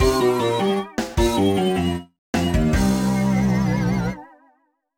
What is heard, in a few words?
Boss Lose; mario vs. donkey kong mini land mayhem; Warped Mansion